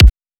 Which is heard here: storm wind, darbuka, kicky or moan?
kicky